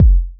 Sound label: Kick, Kickdrum; Equipped Music; Loungin House